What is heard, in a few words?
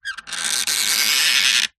foley wood balustrade turn squeak; zapsplat